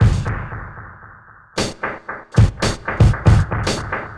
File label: Dub Drums; Sonic Foundry (Sony Creative Software); Stylus Pressure Urban Grooves on Digital Wax